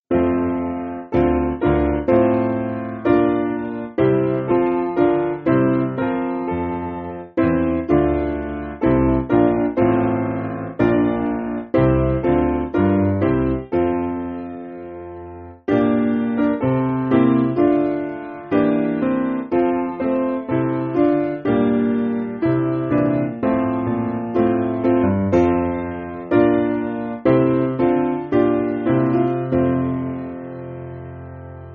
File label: Piano